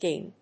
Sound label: ding